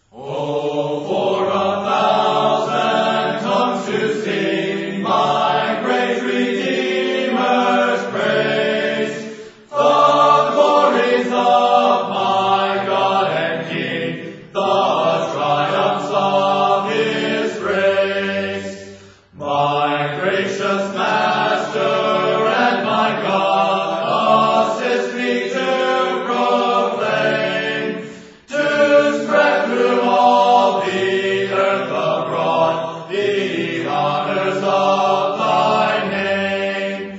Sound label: a capella hymn; oh for a thousand tongues